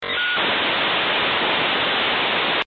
internet sound

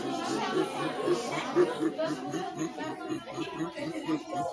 gurgle and groans

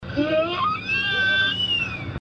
fat kid crying

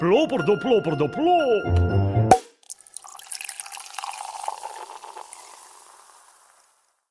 pop

